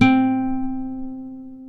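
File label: C3 FLAMENCO, FLAMENCO guitar; WorldSounds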